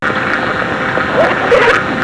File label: Laughing; evp